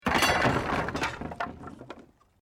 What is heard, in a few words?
Wood Collapse